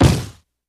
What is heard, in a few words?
Camera flash, flash pot, sharper; cameras